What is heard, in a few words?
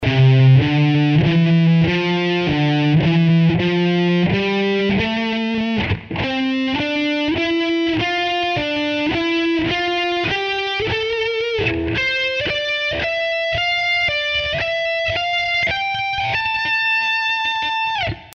scale patterns slow, guitar